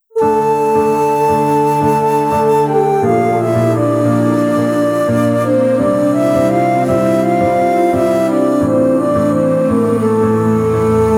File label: Emotional Cover